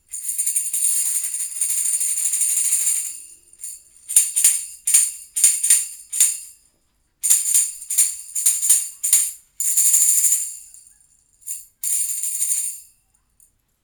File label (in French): cymbalettes, instruments